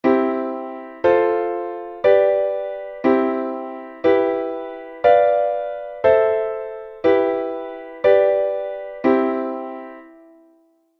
C major, Middle C, I-IV-V-I-III-VII-VI-III-V-I chord progression